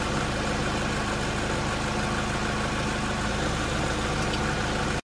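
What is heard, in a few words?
vehicle idle loop hatch; The Sims 3; Windows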